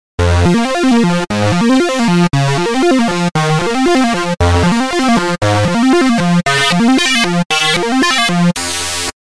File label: Lead